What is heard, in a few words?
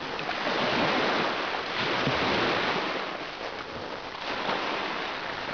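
beach loop, water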